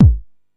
subtle reverb kickdrum sound g sharp key, Royality free bass drum single shot tuned to the G# note